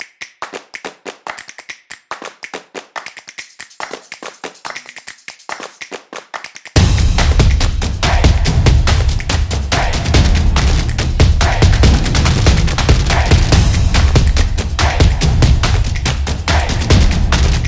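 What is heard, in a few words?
Movement, Street percussion background music built for sport, motion, and dance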